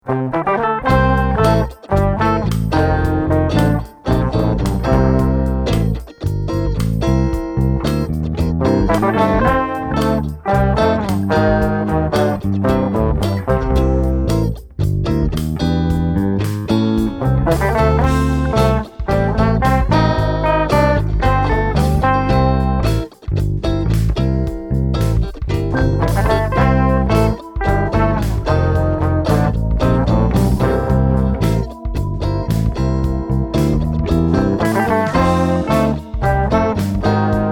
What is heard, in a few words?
shuffle